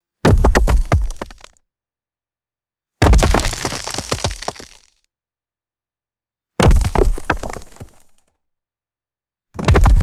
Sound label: heavy rocks dropping on dirt